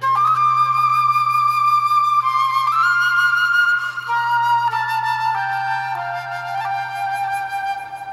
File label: fanfare; dot hack